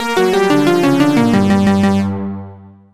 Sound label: game over sound; arkanoid